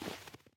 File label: snow step